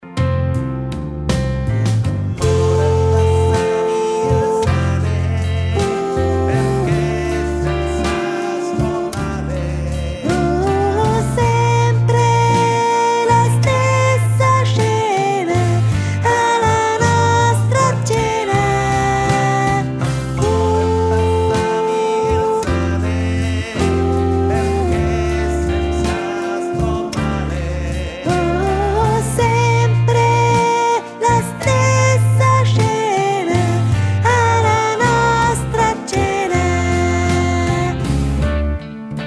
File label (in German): Sopran